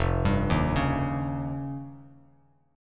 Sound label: F 7th Suspended 2nd Sharp 5th F Bass Standard; arpeggiated; chord; F7sus2#5; 1,10,1,6; JGuitar